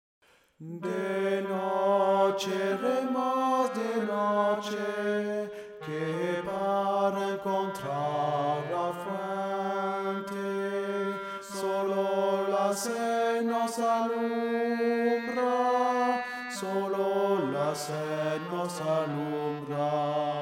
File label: TENOR